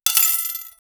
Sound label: cashout sound effect, money